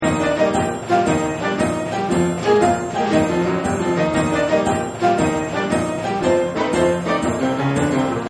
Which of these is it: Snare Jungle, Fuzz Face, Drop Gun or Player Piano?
Player Piano